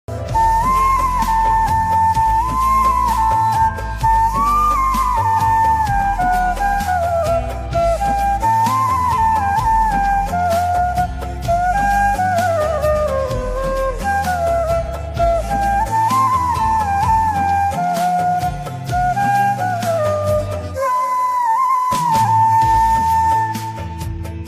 flute ringtone instrument ringtone flute ringtone

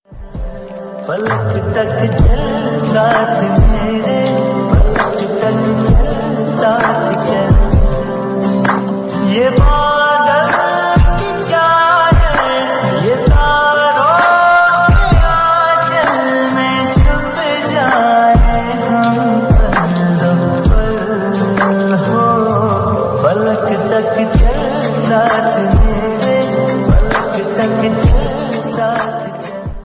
lofi version

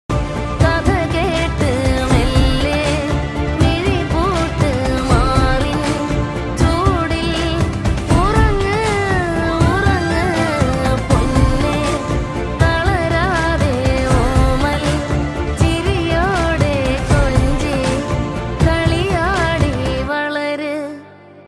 tamil trending song

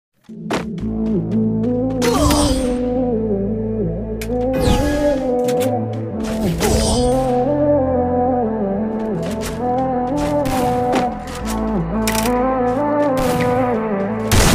ringtone music; free fire

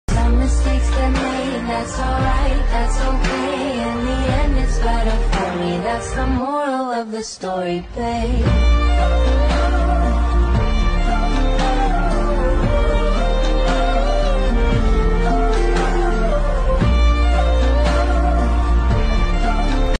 bgm ringtone telugu bgm

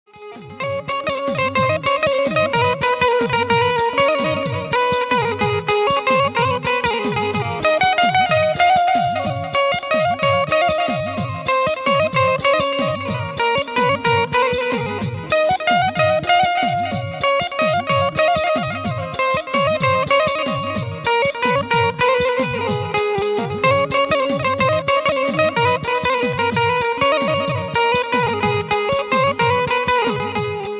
dakla tune by banjo ringtone banjo music ringtone; benjo

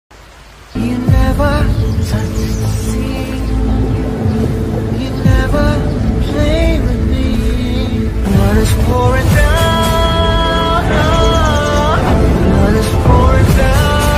bgm ringtone, telugu; devara part glimpse; ntr

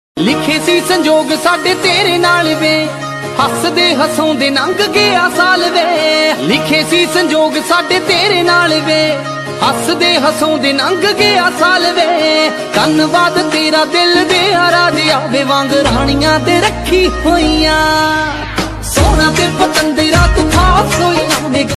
love punjabi ringtone punjabi song ringtone

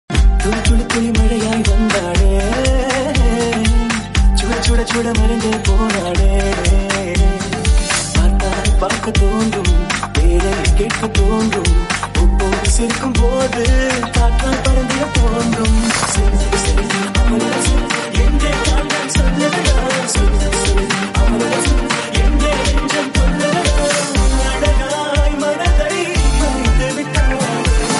movie bgm ringtone remix; paiya

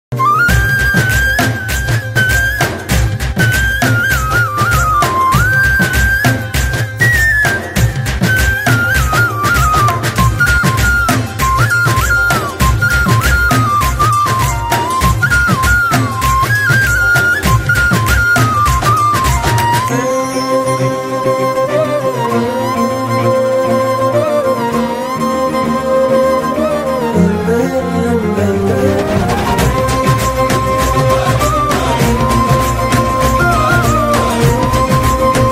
love bgm ringtone; ethir neechal

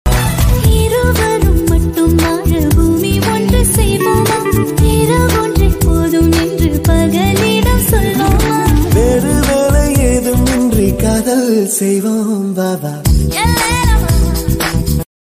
ringtone in tamil version

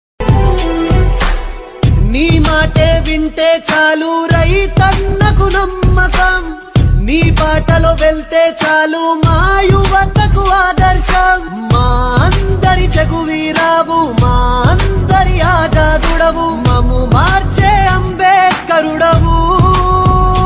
bass ringtone, telugu; janasena; pawan kalyan

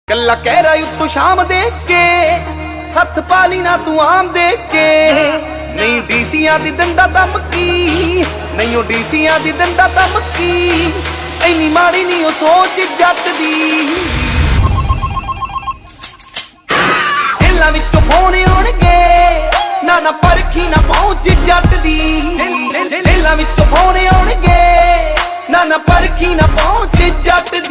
punjabi song ringtone

